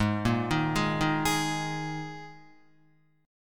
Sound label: Ab Suspended 2nd Guitar; arpeggiated; JGuitar